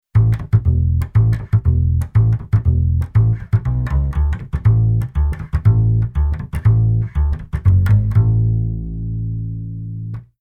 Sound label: Bass Arr Samba Bass